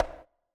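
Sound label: bongo sound short crisp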